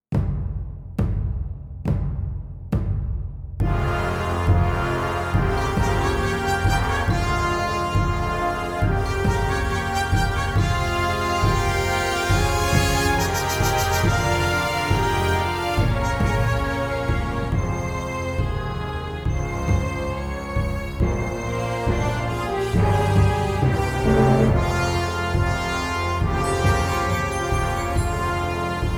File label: Japanese Folk Song